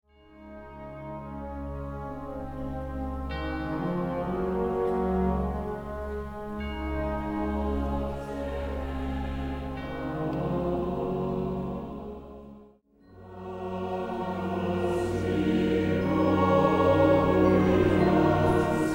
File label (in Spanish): villancico, para coro mixto y/o coro de voces blancas y banda; NOCHE DE PAZ (Stille Nacht) (Silent Night); Fernando Bonete Piqueras